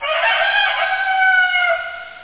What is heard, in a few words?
Yelling; AirMailPro32